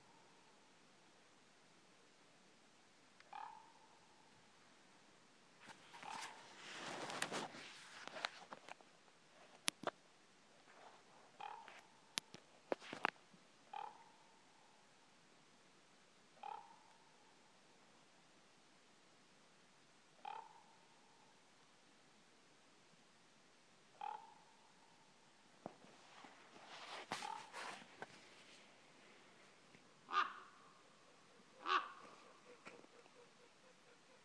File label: raven